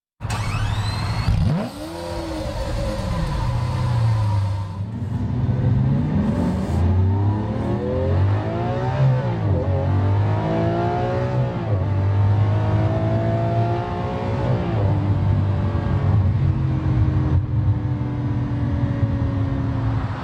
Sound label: Lamborghini Aventador Start Up and Acceleration